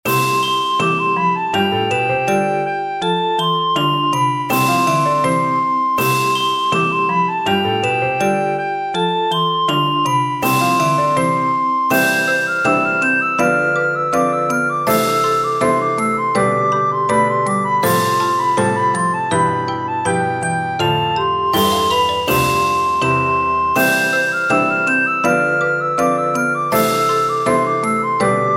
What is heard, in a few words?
Ding dong